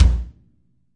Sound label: kick, Multisampled Real Kit; REAL LIVE KITS